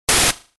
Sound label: noise; ノイズ０１ よくあるノイズ。ザー。